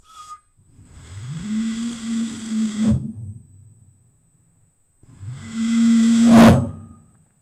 fire out of a gas

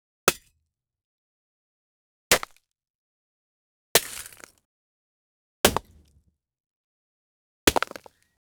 ice axe hit crack gasp; sport

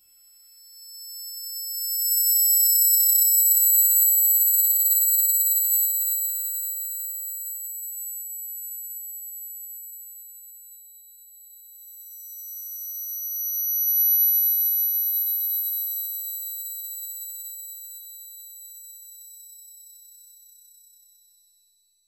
subtle glitter whoosh; jewelry

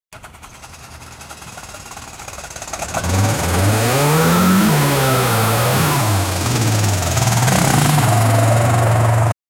sound of someone kickstar, scooter; sound effect

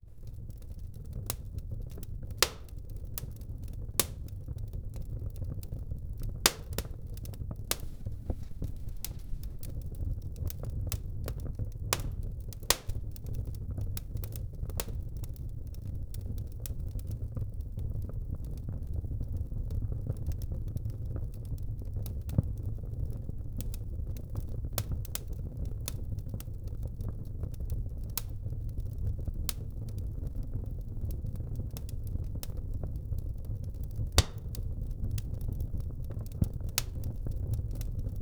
asmr fireplace crash fall

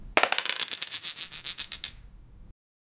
normal dice roll

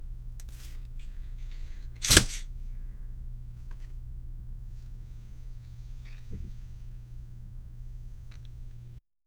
placement of cards, dealing

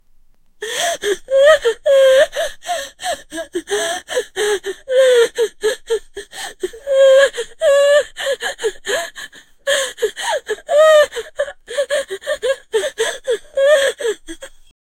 mom crying for his son

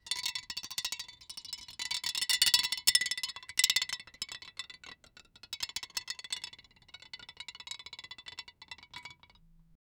metallic

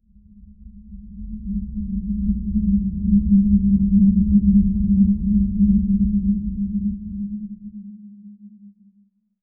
lifesteal full sound dark, action

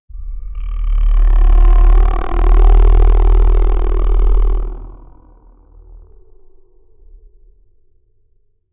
soft purring a gentle meo; pet

